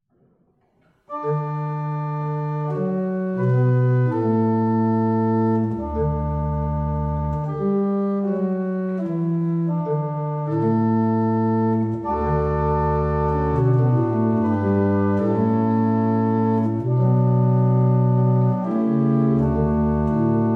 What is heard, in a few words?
Double chant, barrel organs; Staunton